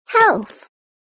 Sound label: sound effect